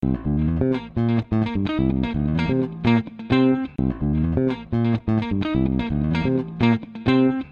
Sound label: Guitar Loops